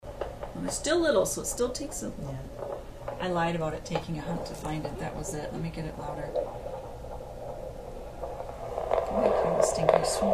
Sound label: heartbeat